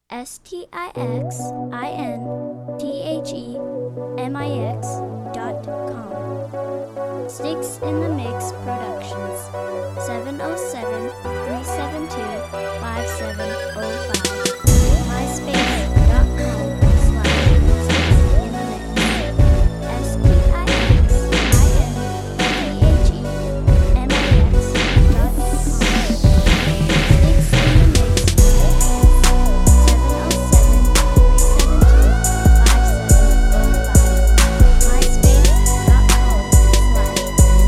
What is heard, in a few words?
slow and low